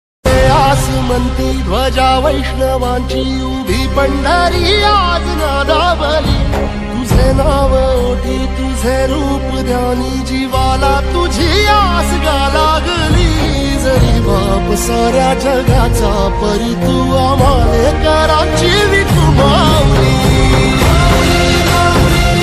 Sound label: ringtone vitthal ringtone